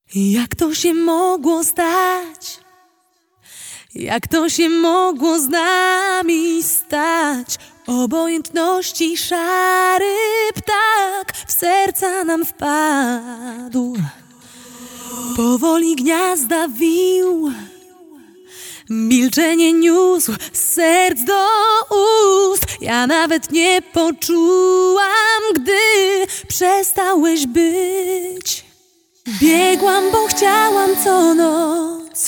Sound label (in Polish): ale we mnie acapella